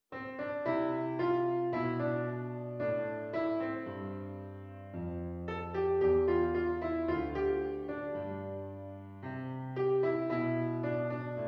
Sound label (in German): Klaviersatz einfach; Cramer